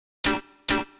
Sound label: Guitar, RnB